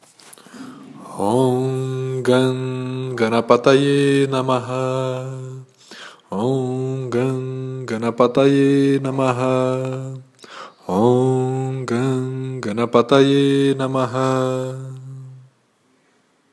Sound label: Mantra A Ganesh